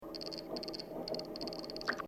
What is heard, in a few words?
Distant train